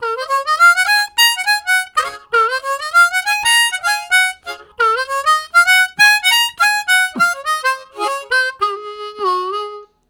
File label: Rising Melody, Harmonica Riffs; Zero G